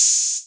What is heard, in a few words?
OPEN HH; USB Soundscan vol.51; AKAI